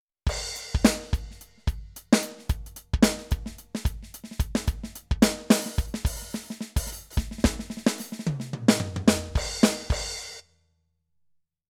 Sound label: Millenium MPS450 Latin